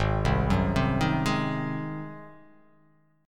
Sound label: Ab 7th Ab down; Ab7 arpeggiated; JGuitar